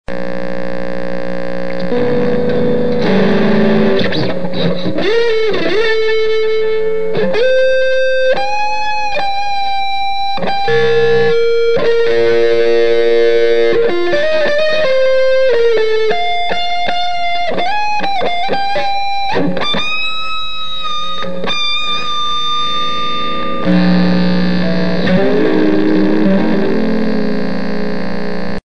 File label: rain solo